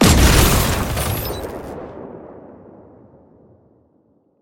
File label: shoot; EMXArms; emx pmg90